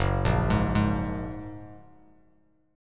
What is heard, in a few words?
Gb Major Add 9th, down, Bass Standard; arpeggiated; JGuitar